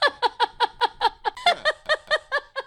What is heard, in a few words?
Cackling Witch